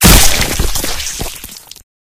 gibbed

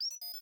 bot default, robot

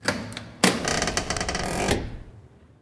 open, door, metal; open close

